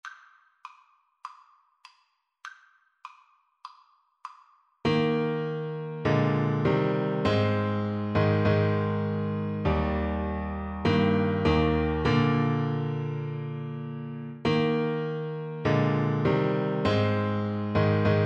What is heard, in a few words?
nearer my god, Trombone